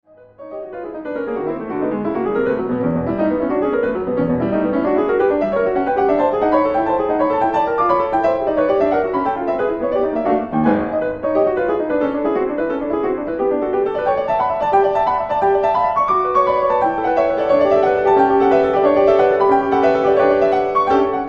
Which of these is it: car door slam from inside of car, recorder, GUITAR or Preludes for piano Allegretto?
Preludes for piano Allegretto